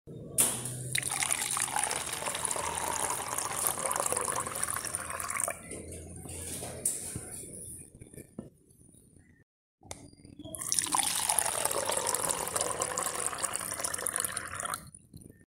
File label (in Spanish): Agua cayendo